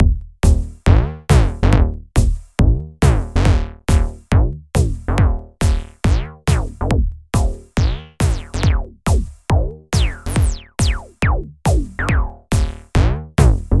glide

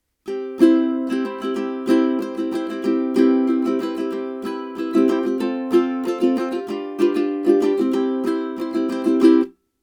DPA 6060 Ukulele 40db Gain

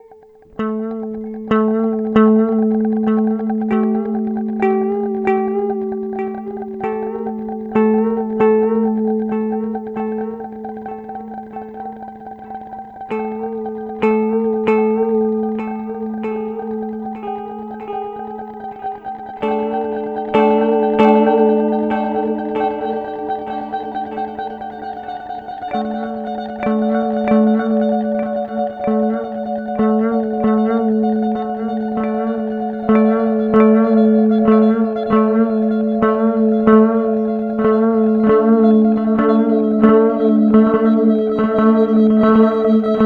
Radical Delay Dual; Alexander Pedals

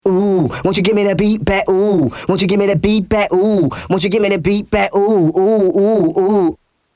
Vocals full on; Heritage Audio HA-609A

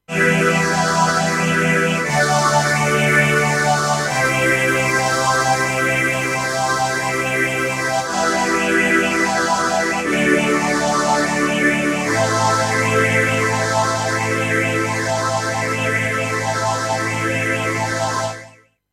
Vintage String Ensemble, tc electronic Helix

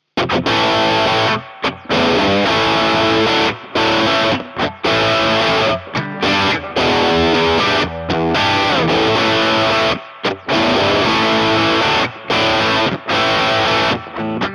Jukebox 30 Top Boost High Reverb; Audified ampLion 2

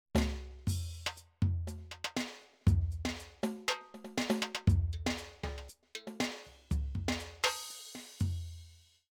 Perc Brasil; Simmons SD1200

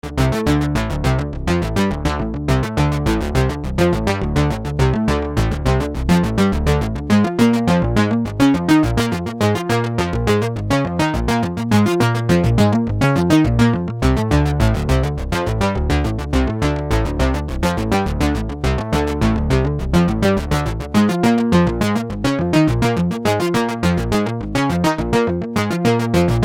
Gate SEQ ARP2600

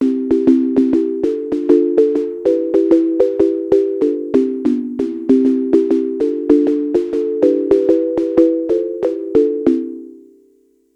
Sequential; Sequential Take5

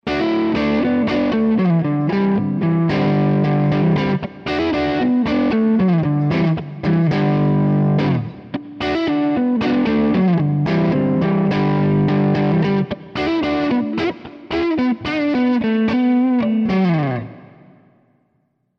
Epiphone Les Paul 60s Neck PU Crunch

